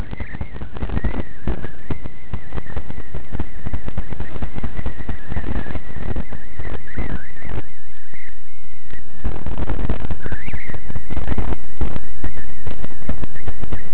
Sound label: telluric; ELF-VLF; Telluric Currents; Theory